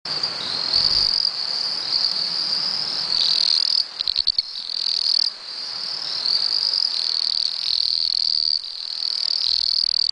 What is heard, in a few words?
Dendropsophus microps